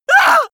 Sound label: male painful shout short; comedy-cartoon; soundpool